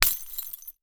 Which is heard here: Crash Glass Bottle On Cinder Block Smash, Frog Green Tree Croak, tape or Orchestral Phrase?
Crash Glass Bottle On Cinder Block Smash